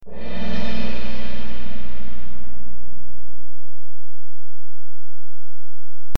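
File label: Loop orchestra cymbal hit 160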